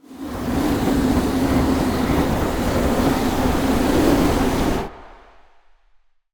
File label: Ice Spell Freezing Slowing Frigid; Arctic Aura